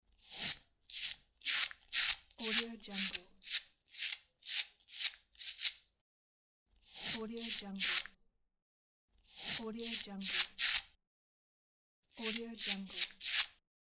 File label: quick shaving with razor